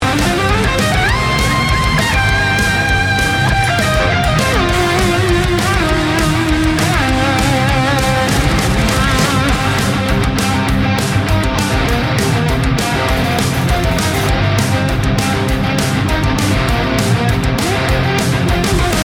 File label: Instrumental